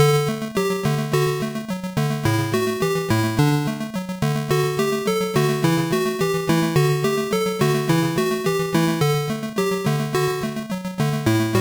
Taiko Performance Song

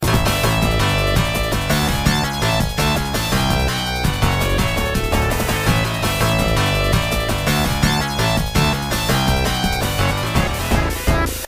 High Score & Jackpot, pinball; Atlantis; Bally

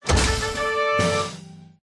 City Move; the great ottomans strategy battle for throne android

